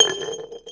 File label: JAR ROLL; Rarefaction; Poke in The Ear With A Sharp Stick